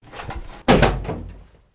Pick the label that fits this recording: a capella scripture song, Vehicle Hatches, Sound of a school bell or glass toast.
Vehicle Hatches